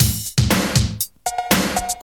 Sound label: 80 bpm drum loop sample A sharp key, kick tuned to the A# note